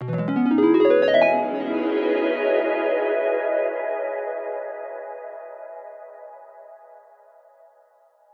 E Phrase Electric Harp; Zero-G; Future Wave

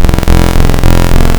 Square, wavetables; Architecture 32

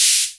HAM CYM, Hammond Rhythm2

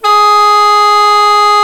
THAI PIPES, World Combo

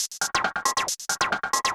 Percussion, Club Techno, 137 BPM; Zero-G; Construction Kits

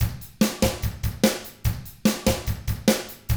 rock drums

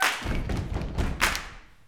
CLAP FEET

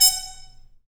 Harmon Stabs, Solo Brass; Harmon Amb

